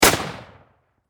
Warfare Rifle M1 Garand Semi Single Shot Close Perspective; Rifles